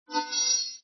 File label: Asterisk, Windows; Whistler